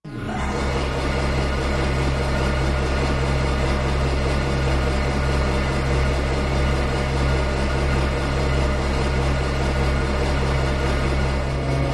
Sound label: Generator, Machine, Large Rotating, Movement, Idle, Generator; power core hum; Happy Generator, Machine, Large Rotating, Movement, Idle, Generator